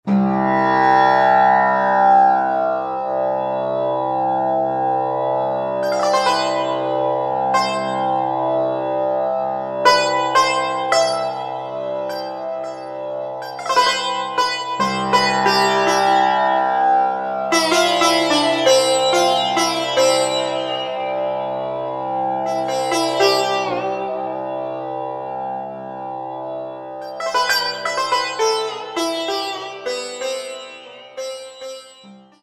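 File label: Sitar with Drone, Roland SR-JV80-14 World Collection Asia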